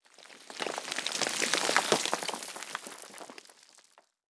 explosion impact dirt

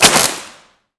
laser beam

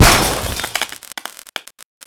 fx explosion robot

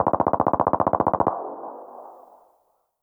Rifle Laser Gatling Fire

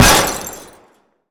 explosion mine bottlecap; lunchbox

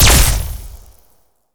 WPN Pistol Alien Blaster Fire Player

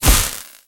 fx laser impact

